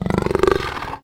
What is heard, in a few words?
Leopard Growl Nasal; big cats